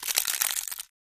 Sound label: Plastic Crush Med